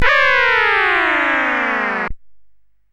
disk version CSG8580R5; sid

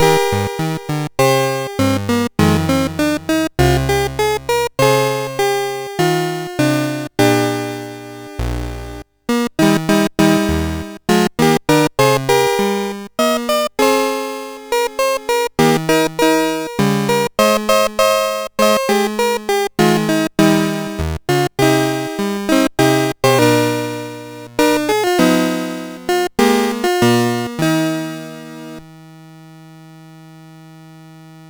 Shenandoah, sid MOS6581R3; Master Composer

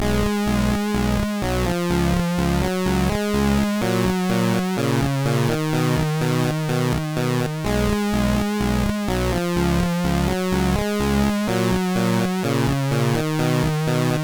Aiaia, MOS6581R3

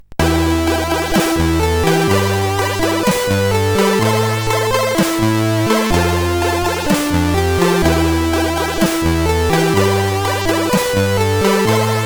remix, sid CSG8580R5

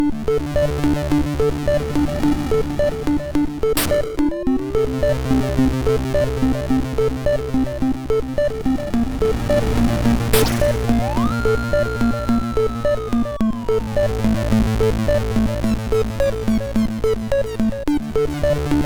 BoxyMoxy, MOS6581R4; hvsc

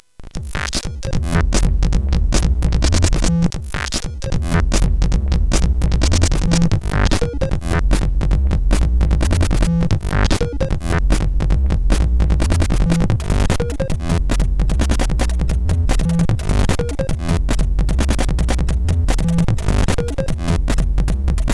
Drummachine; CSG8580R5; sid; hvsc

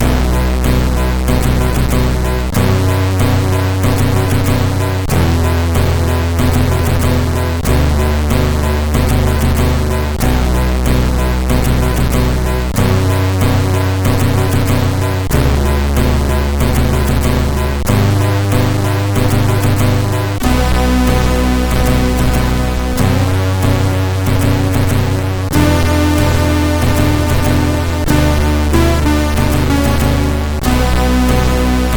Converter intro, sid CSG8580; HVSC

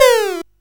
Dark Castle, CSG8580R5

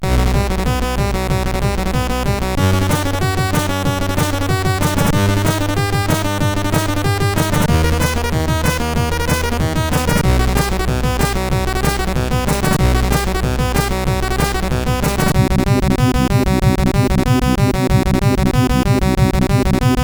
Intro, CSG8580; CSG8580R5; hvsc

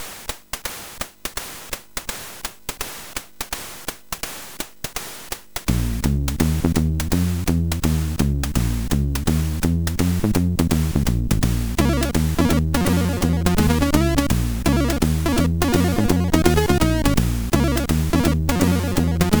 Jazz Test, MOS6581R4; hvsc

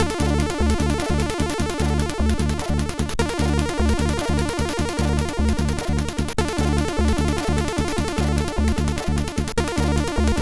Techno, sid MOS6581R4

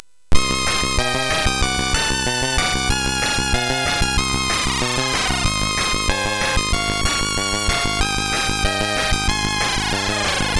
Synth Sample, MOS6581R3; Final Synth Sample II; hvsc

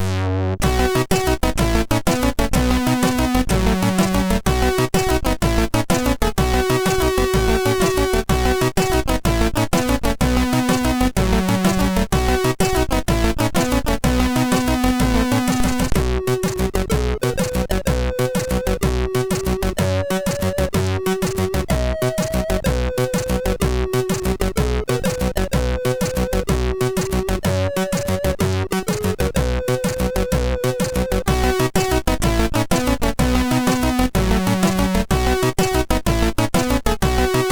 sid MOS6581R3; Russia

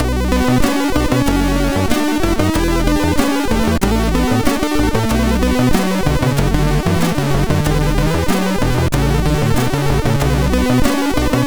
Test Music, sid MOS6581

